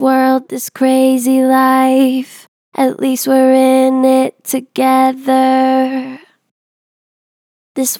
Gen Vib World this crazy life, Vocals, Natural Vibrato Gentle; Harmor Vocals